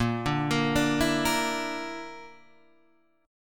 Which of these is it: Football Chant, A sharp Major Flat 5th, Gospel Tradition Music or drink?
A sharp Major Flat 5th